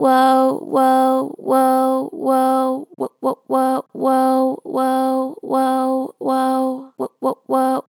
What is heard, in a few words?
Vib Woah Wo, Vocals, Natural Vibrato Strong; Harmor Vocals